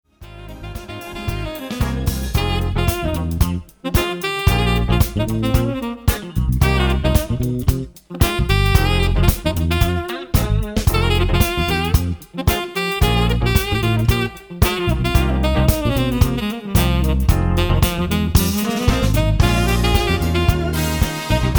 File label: Street life Sax